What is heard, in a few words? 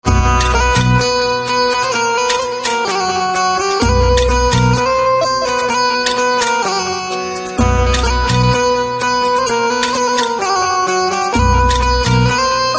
Banjo Instrumental Ringtone